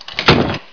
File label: mbox close; nzp